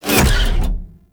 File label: Bullet Jump Land, Motorcycle; Warframe; Motorcycle Ninety Nine